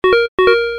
Score Beep

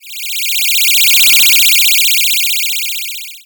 Security Scanning

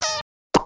Cartoon Smoochy Squeak Plop

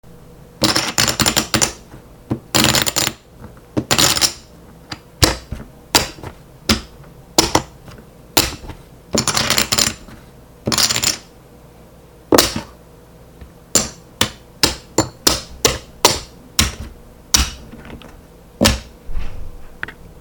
Poker Chips

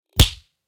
Cartoon Punch

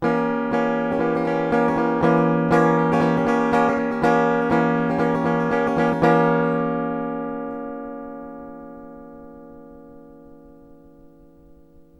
E0 Rythmchord 120bpm

